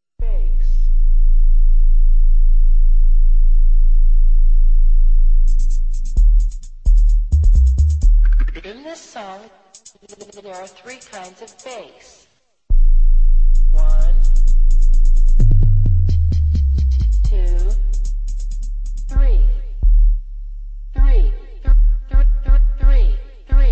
bass

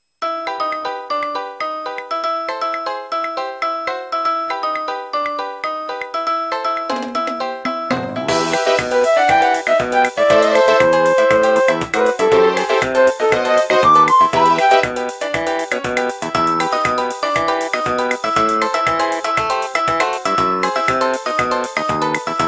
Nokia Montuno

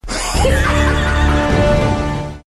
Squeal Laugh; Joker

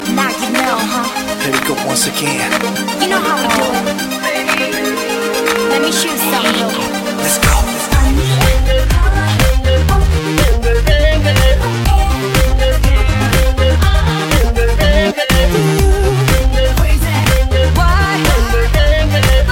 hot k pop

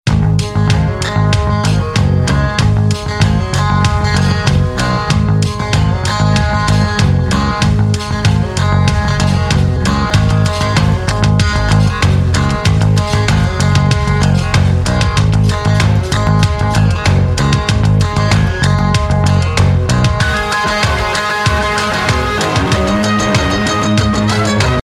rock ringtone

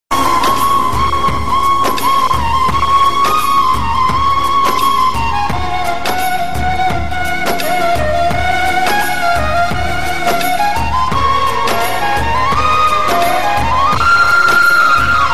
SAD LOVE INSTRUMENT

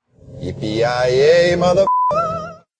yippie yai yay

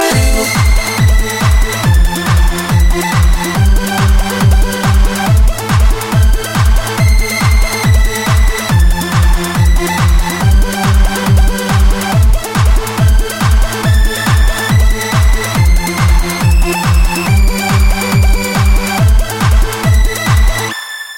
best interlude; blues

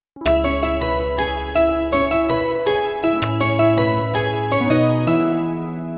perfect msg tone; ringtones; sms

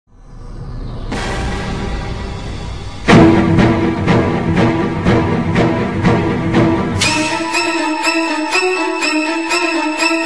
horror mix

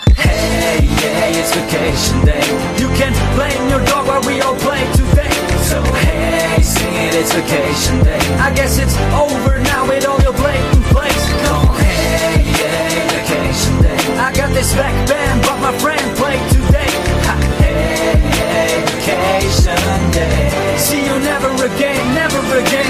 snake blues

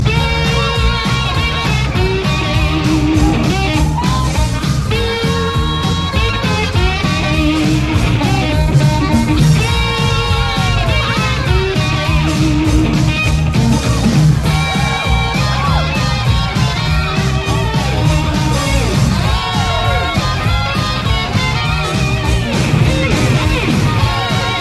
blues duel, ringtone

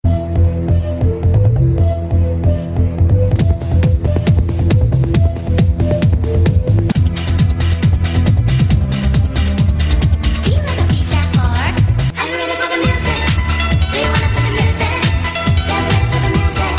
Piano

